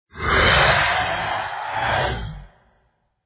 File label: death